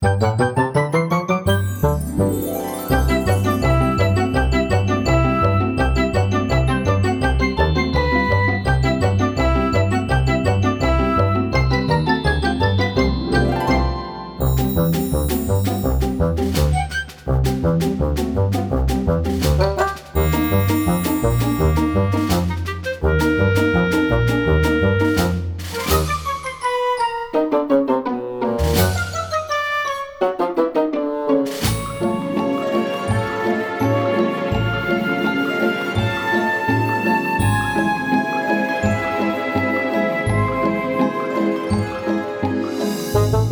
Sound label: B Menu Event; dankira boys be dancing